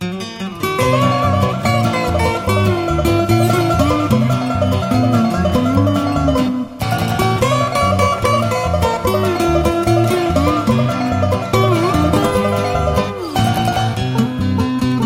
picking; reprises

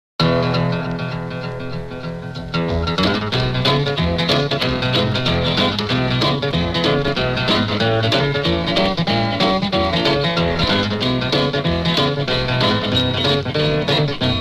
guitar boogie; son amerique

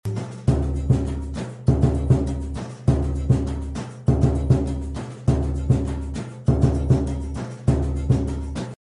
surdo; le bresil

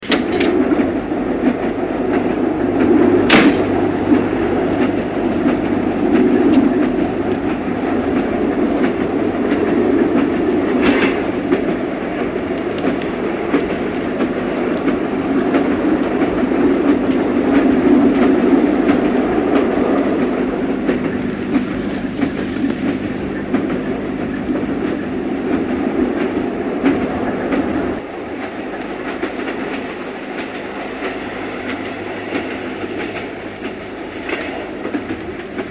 train - interieur - inside

